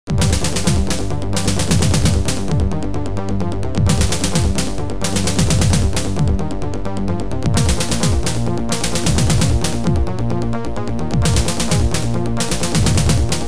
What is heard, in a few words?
OPL2; Tyrian